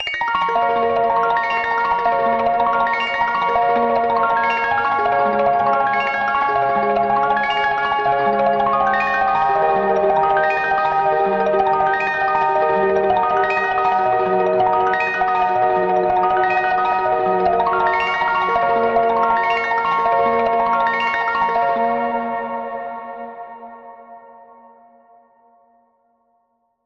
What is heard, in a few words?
Arp Flutters